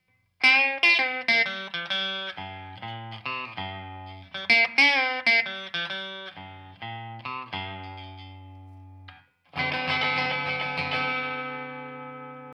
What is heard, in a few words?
tele chevalet manche